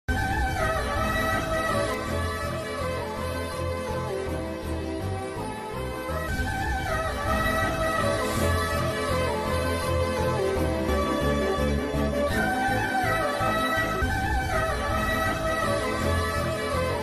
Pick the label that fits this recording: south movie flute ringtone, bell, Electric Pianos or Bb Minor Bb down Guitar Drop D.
south movie flute ringtone